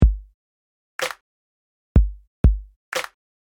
slow south; artur4ik

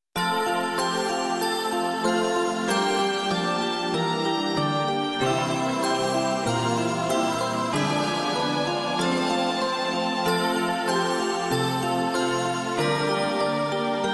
christmas carol

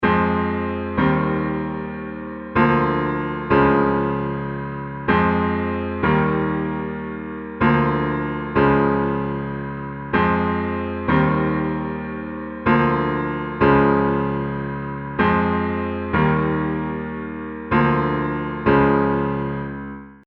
rnb hip hop piano; cufool

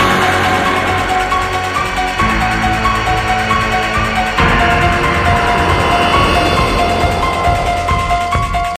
halloween loop

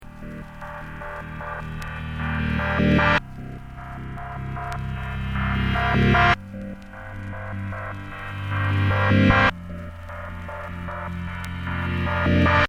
filtered piano; cufool

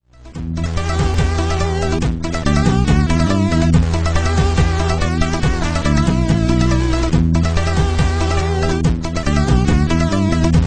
ringtone

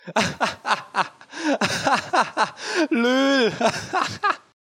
laugh; Megalaugh; MINOR2GO

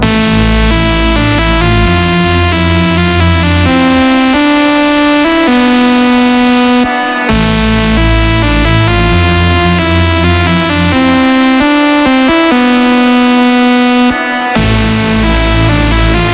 waves

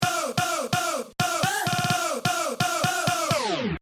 voice lead

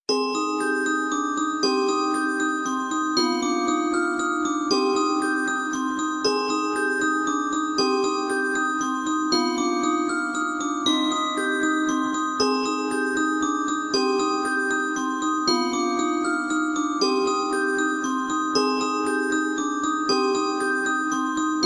lullaby tone

